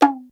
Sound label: prc C2, Sho Percs Pad Shaku, CASIO FZ-1; Retro Sampler